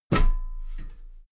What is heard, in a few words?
step metal ladder step top regular